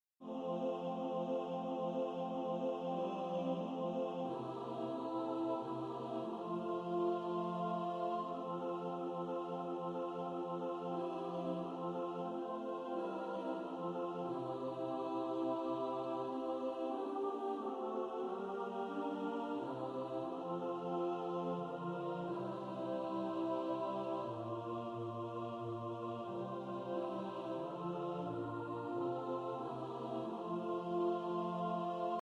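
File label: alto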